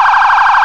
emv priority, sirens federal; federal sig ss

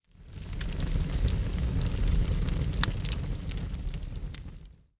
Fire Wood; blue archive

